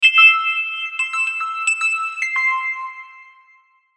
Musical Twinkle; Musical Twinkle Melody; Miscellaneous Musical; Blastwave

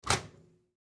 Microwave Door, Microwave Door Open; Household Microwave Open Door Appliance; Microwave Doors; Blastwave

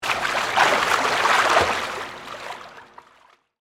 Pool Indoor Splash, Water; Pools

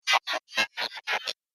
Droid Slow Power Down, robot; Sci-Fi Robot; Robot Sound Effects

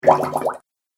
Goop Sound, cartoon; Cartoon Sound Design Sound Effects

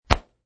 Slap Noise

